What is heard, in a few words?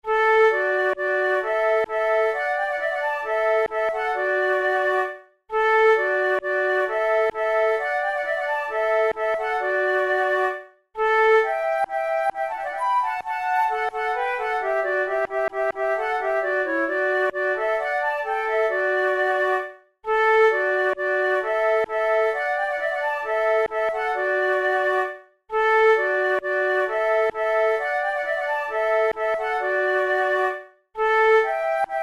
duet; O pescator